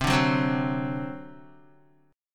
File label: C Suspended 2nd Flat 5th C down Guitar; Csus2b5 strummed